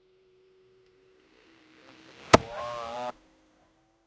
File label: motor bike jump; motorcycle